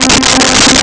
Syn Str; BGM; Nintendo; July 2020 Gigaleak; DrMarioSound

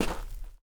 dirt run, footsteps; chief

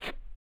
jump, footsteps, cement; chief

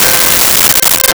Dulcimer C5; Nintendo; July 2020 Gigaleak

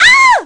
crashvoice; PLAYER VOICE; Nintendo; July 2020 Gigaleak

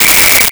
Whistle; Nintendo; July 2020 Gigaleak

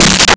Synth Drum; Nintendo; July 2020 Gigaleak

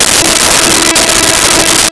Strings E4; Nintendo; July 2020 Gigaleak

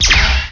bomb; Nintendo; July 2020 Gigaleak

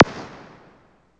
High Q, Drums; BGM; Nintendo; July 2020 Gigaleak

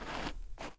dirt shuffle, footsteps; chief

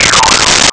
Se Spring; BGM; Nintendo; July 2020 Gigaleak; DrMarioSound

